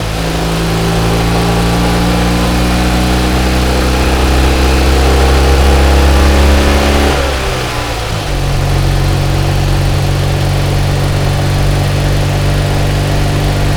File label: fourth cruise loop, vehicles